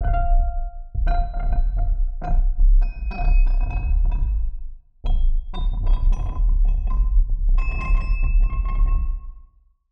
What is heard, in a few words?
SFX Forging, Sylenth1; Routed Sound; Sylenth1 Expansion - Doom Carpenter Vol.1